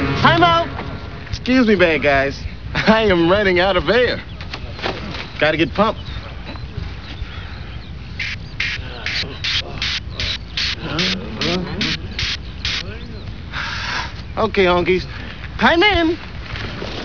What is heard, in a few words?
air; hood